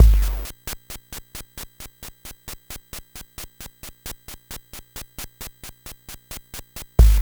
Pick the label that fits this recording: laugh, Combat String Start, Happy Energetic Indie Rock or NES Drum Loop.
NES Drum Loop